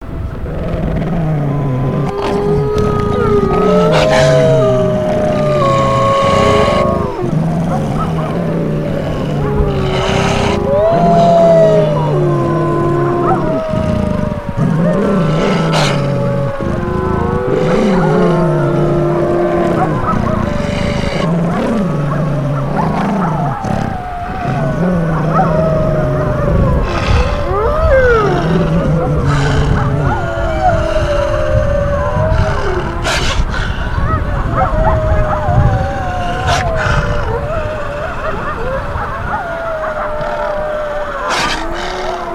snarling werewolves; Halloween Nightmare CD Sound Effects

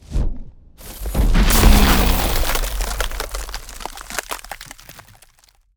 Ice Spell Whoosh, Cold Whooshes; Arctic Aura; Motorice

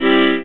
Cartoon Accordion Squeeze; SFX Kit; Foley - Misc

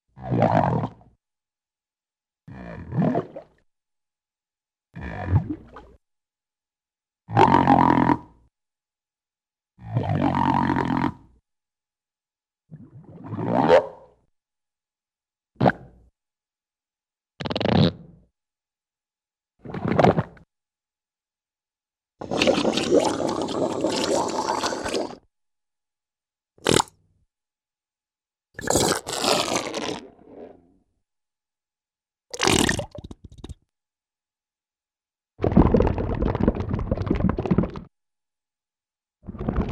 Mud Pots - Blurps Bubbles Goo; Cartoon; Hollywood Edge; Cartoon Trax

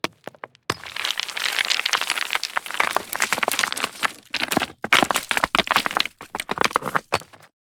Earth Enemy Attribute Loop; AudioWizards

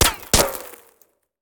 Bullet Ricochet; Sound Ideas; Guns

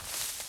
grass; firefight

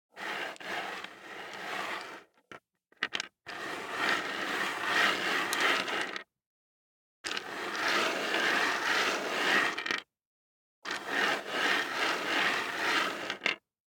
DICE on Hard Wood, Slide, Standard, Sixteen Dice; Samples; ArticulatedSounds; DICE Sfx Party (Extended)

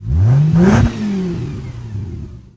Prowler Rev, Automobiles

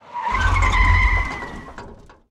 skid GPS; Blind Drive

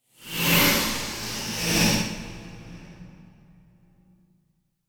Whoosh Flying Ghost By; Hollywood Edge; sound designer's toolkit v2

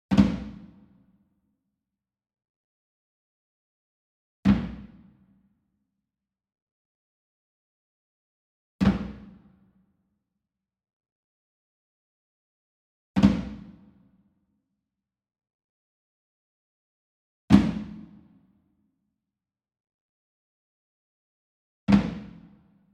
SMALL DRUM Tabla Flam Hard Beater Medium; boom library; Cinematic Strikes Construction Kit

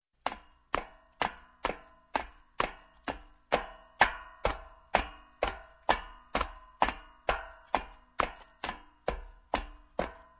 Footsteps, Metal Down Metal Steps Shoes, Slow; Sound Ideas 2000 Series

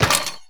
Menu Mechanical; Menu FX

